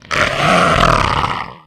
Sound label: zombie growl, hunter voice idle